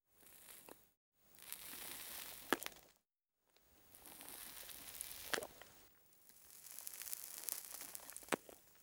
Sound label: burn; cigarman